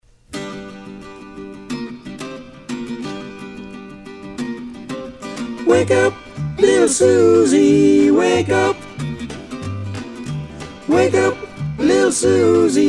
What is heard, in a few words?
Mezzo; Choir; Harmonies